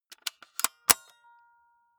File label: walkman close clink